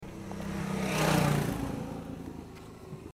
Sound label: motorbike